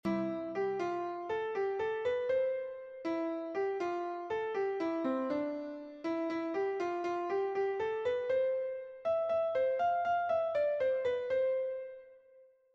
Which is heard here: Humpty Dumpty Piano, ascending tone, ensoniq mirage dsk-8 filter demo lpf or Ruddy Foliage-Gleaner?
Humpty Dumpty Piano